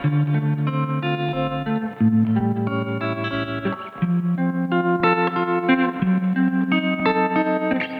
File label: Trem Guitar, 70s Analog Funk; Sonic Foundry (Sony Creative Software); What it Is!